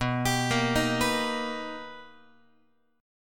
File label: B Augmented Major 7th B Guitar, chord; B+M7; arpeggiated; x,7,10,8,7,10; JGuitar